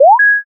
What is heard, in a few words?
unlock